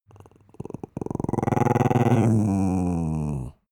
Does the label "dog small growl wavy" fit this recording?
Yes